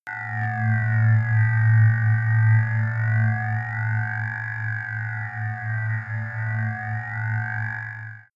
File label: sci-fi ambient scanner low